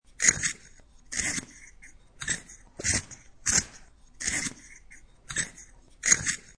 Sharpen Pencil, Using a hand held manual pencil sharpener; Offices; Pencil Sharpeners